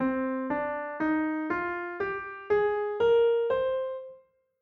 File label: C natural minor; Scale